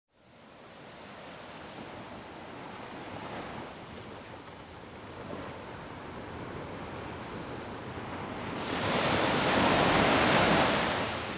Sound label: Sleep Music of Gentle Dreams and Ocean; Sleep Music of Gentle Dreams with the Ocean; Sound Sleeping